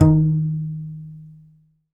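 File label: contrabass balalaika, Plucked Strings; E-MU Producer Series Vol. 7 – Old World Instruments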